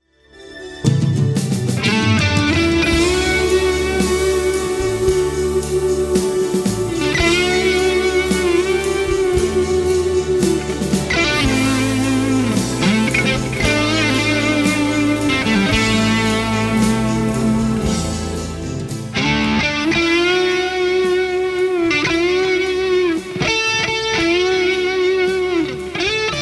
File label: Solo